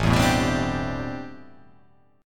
A Minor 11th A, Chord

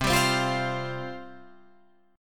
C Suspended 4th C Guitar Drop D

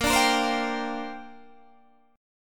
Bb 6th Add 9th Bb Guitar DADGAD

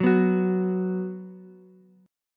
B 5th B Ukulele, chord; strummed

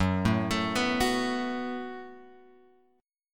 F sharp Minor Double Flat 5th F sharp; F#mbb5 Chord {2 0 X 2 0 2} arpeggiated

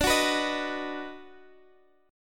D sharp Minor Major 7th Sharp 5th

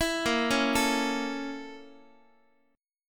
A sharp Minor Major 7th Flat 5th A sharp Guitar; A#mM7b5 arpeggiated; JGuitar

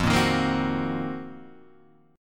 C Minor 7th Flat 5th F, chord; Cm7b5/F strummed; JGuitar

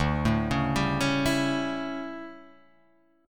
D Major 7th Suspended 2nd Suspended 4th, chord

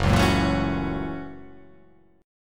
F sharp 7th Sharp 9th

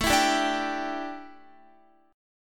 A 7th Suspended 4th Guitar, chord; A7sus4 strummed

